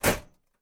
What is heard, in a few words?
Server Batary Close; Game rip; MiSide